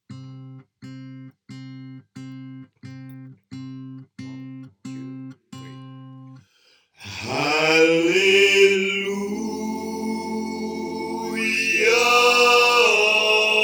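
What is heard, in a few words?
choir part soprano, Chorale Gospel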